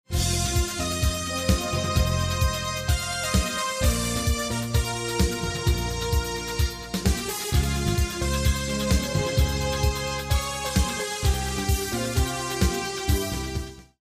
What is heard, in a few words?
choose team; music kits custom